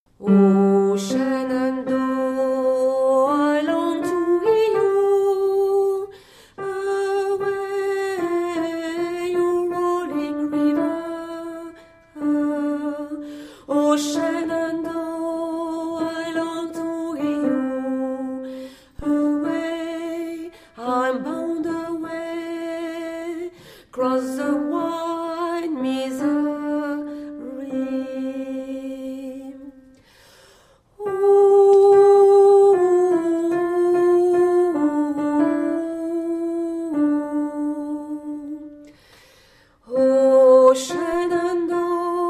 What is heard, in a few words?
Shenandoah, Alto